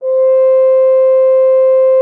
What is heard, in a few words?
FR HORN C5; Xsample VOL-3